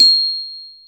C6 glock; InVision Interactive; Lightware VOL-1; Instruments & Percussions; GLOCKENSPIEL

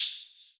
BELL; ILIO - Ethno Techno

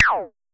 Pollard Perc, Syndrum

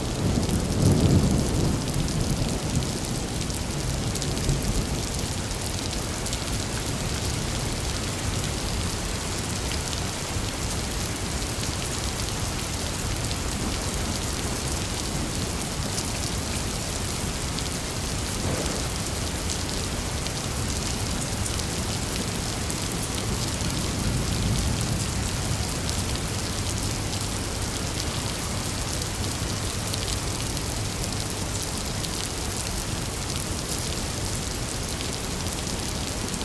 thunderstorms 32 bar

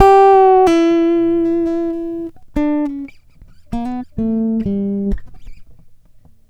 Guitar, Trip-Hop Loops; Sonic Foundry; Rondo Brothers Trip-Hop Loops & Samples; Hooked On Hookers

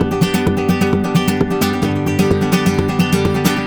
130 G Rumba; Spanish And Gypsy Traditions; Soundscan vol.59; AKAI

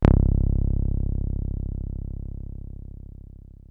303 C1, TB 303

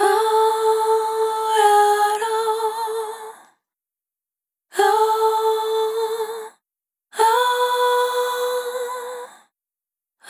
Dry, Multi-Voice Loops, Vocal Samples; Sonic Foundry (Sony Creative Software) - Pocket Diva World Class Vocal Samples

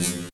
HH, Drum & Bass, 160 BPM

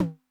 Tom Mid Accent High Tone Min Decay, 909

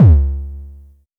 High Kicks C#2; LIMAT Div Dept; Drums

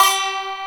YANG-CH'IN; AKAI S6000 CD-ROM - Volume 1

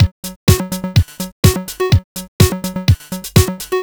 125 Full, Drum Loops 125bpm; Nu Dance Electro

